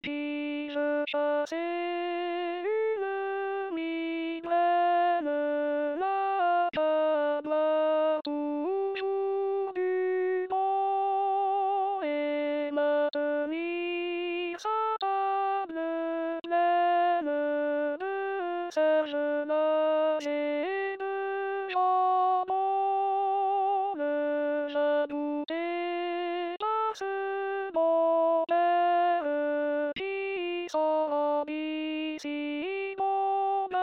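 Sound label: alto; Bataille; chanson a boire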